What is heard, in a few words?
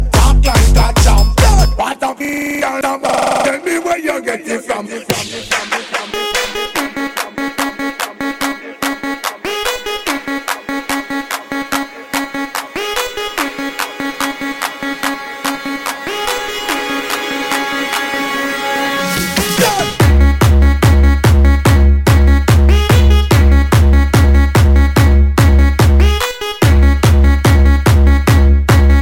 ragga jump